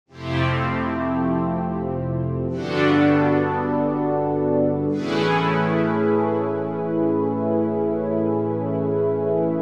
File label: Pad; Violet